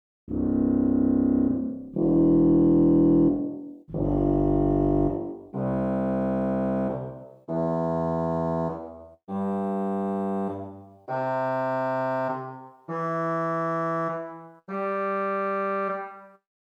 Ped Fagotto16 arp, organstops

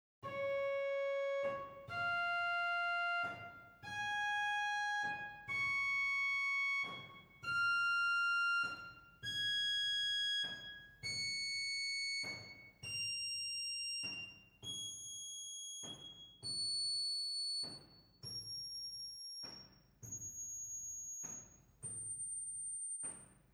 Suffleute 1 arp, organ stops; Reinhardtsgrimma; typical Silbermann middle-sized church organ

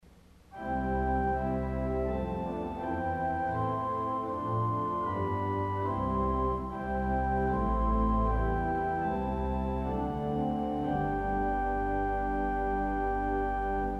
Harmonic Flute St Anne Solo, organ stop; 4'; harmonic from f#2; Möller 1951; CulverAcademy

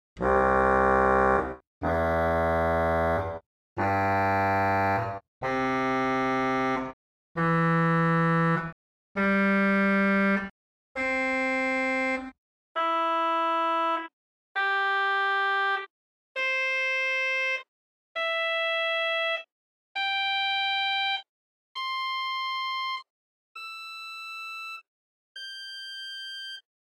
Trompette, organ; arp; Romanswiller

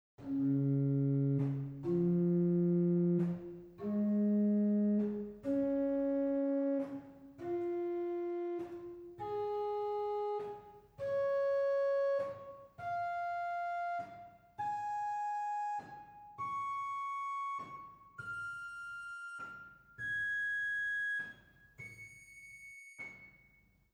Spizfleute 4 arp, organ stops; Silbermann middle-sized church organ; Reinhardtsgrimma

